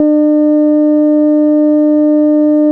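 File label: Yamaha CS80 D# 4; ESOTERICANLG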